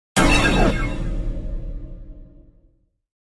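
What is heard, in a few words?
Hit Low Metallic Clang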